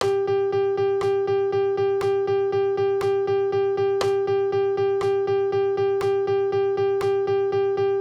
double croche binaire

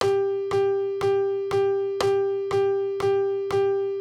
noire binaire

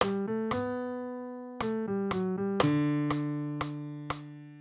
exemple point d'orgue

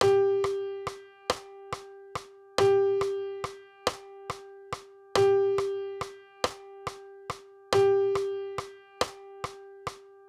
blanche pointee ternaire

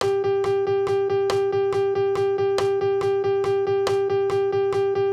double croche ternaire

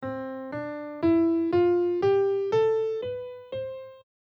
illustration octave ascendante

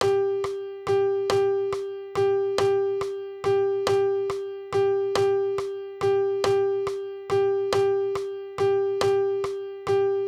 noire croche ternaire

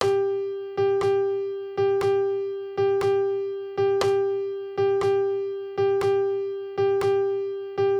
croche pointee double binaire

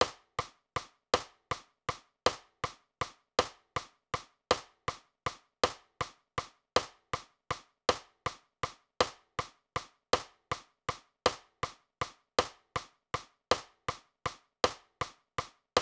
metronome ternaire

